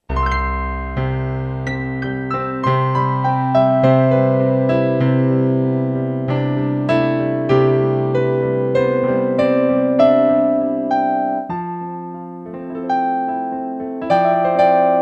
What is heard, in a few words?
AP Grand, Yamaha TG77; Factory Presets